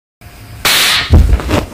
slappppppp

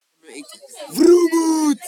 vrumut bass boosted

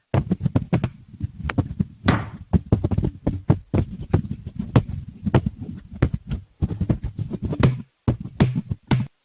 mic punch

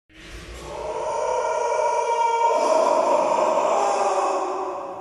reverb

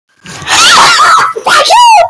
haha

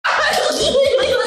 funny he he; unspeakable

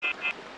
phone fail

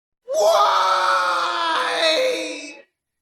whyyyyyyyyy scream; letter y

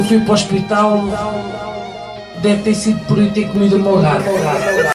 guitar

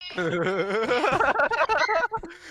laugh

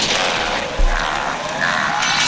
toilet laugh

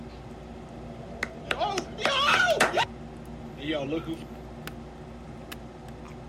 clap sound; prank em john

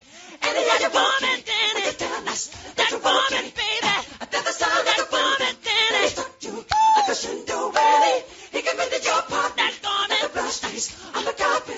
smooth adlib

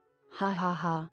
hahaha cucurucho

